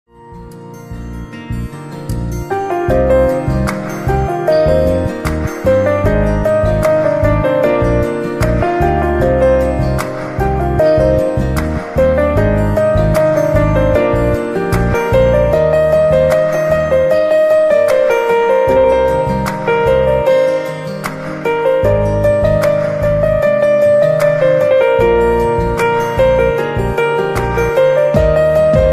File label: bollywood cool music ringtone